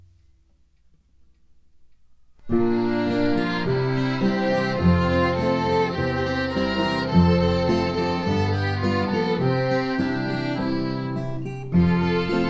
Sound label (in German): Instrumentalmix; Play-Along